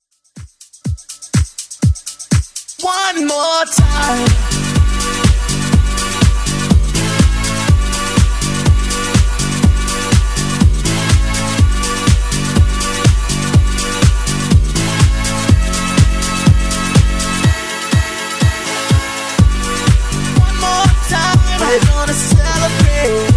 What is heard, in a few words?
123bpm